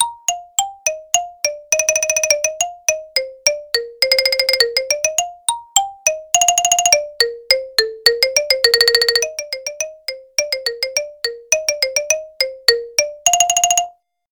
MALLETS; middle school; Region 23

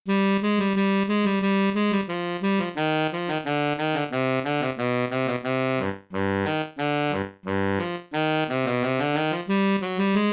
Bari Sax fast; Eb BARI SAX Technical; Region 23 Middle School Etudes for 2026-27 (Year C)

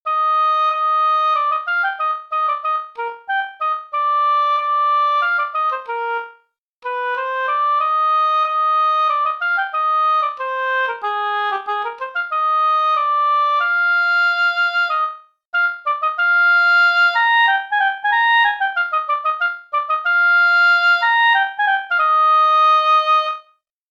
MS Oboe C fast, etudes; OBOE technical; Region 23 Middle School Etudes for 2026-27 (Year C)

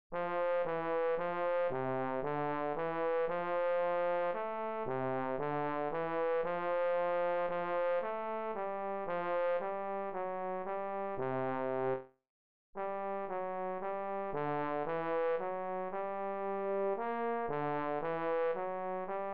MS Trombone C slow, etudes; TENOR TROMBONE Lyrical; Region 23 Middle School Etudes for 2026-27 (Year C)

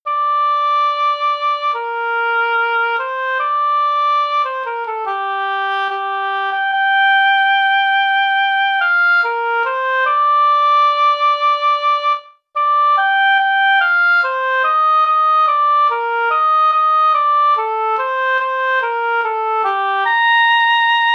Oboe C slow, etudes; OBOE Lyrical; Region 23 Middle School Etudes for 2026-27 (Year C)

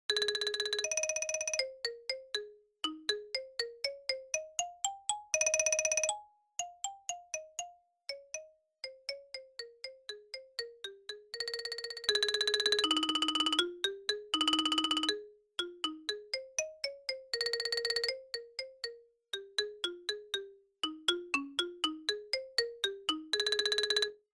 MS Mallets C, etudes; Region 23 Middle School Etudes for 2026-27 (Year C)

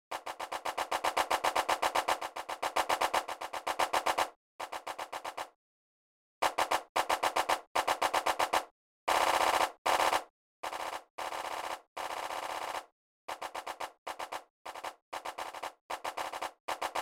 MS Snare Drum C, etudes; Region 23 Middle School Etudes for 2026-27 (Year C)

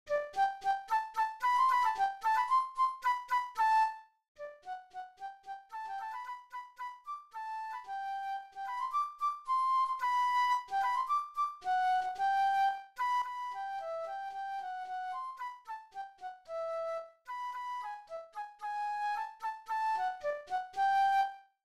MS Flute C fast, etudes; FLUTE Technical; Region 23 Middle School Etudes for 2026-27 (Year C)

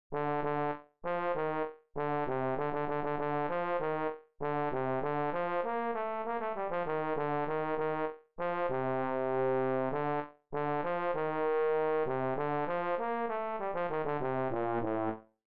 Trombone C fast, etudes; TENOR TROMBONE Technical; Region 23 Middle School Etudes for 2026-27 (Year C)

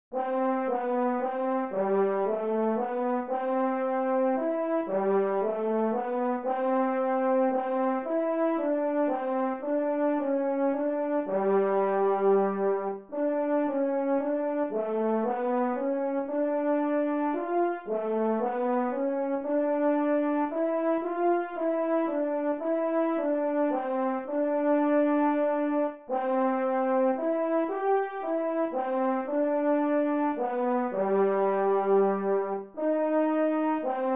MS Horn C slow, etudes; F HORN Lyrical; Region 23 Middle School Etudes for 2026-27 (Year C)

